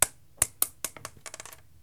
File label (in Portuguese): Pedra